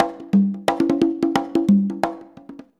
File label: CONGA, Modern Percussion Loops